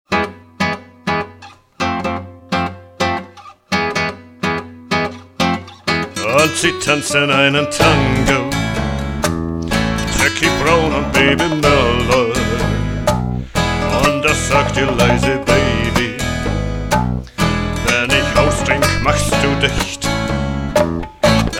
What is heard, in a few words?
Tango